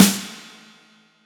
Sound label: Snare